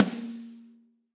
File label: Snare Rim Ambient Loud Punch; Drum Hits; Standard Hits; Ableton; Factory Packs; Session Drums Studio